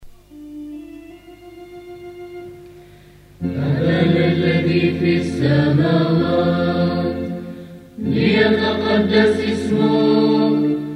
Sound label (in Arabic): أبانا لحن روسي, hymns